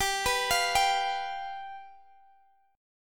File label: G 7th G down